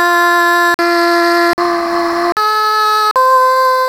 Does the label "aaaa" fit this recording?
Yes